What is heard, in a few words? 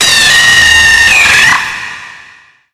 jumpscare sound; the dark nights at gumballs 2